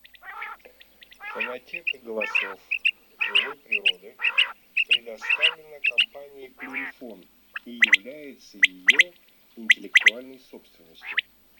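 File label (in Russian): Перепел Quaglia, chip mini для манка; plurifon; chip mini 18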